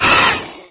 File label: chatter combat; doom3